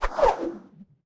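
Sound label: weapon cavalry javelin; Battle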